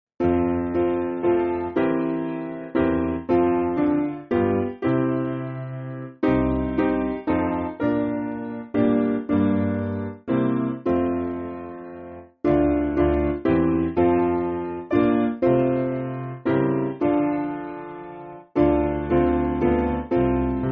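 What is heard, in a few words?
Piano, Music Backing Files for Churches; O Breath Of God; Calm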